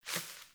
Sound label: Sparrow One Wing Flaps